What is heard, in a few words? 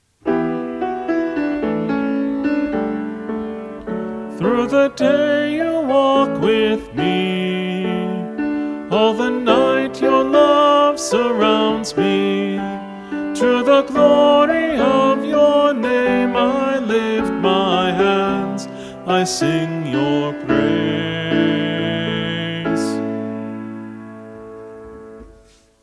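All Parts, Choir Practice Tracks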